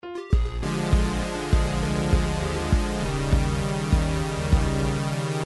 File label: roller coaster death xg; super nintendo; sequenced; donkey kong country 2